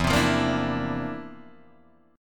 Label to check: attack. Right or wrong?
Wrong